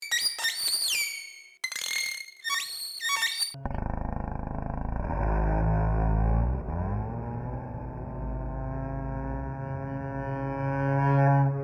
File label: noise